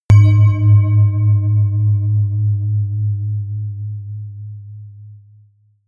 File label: melodic bass bell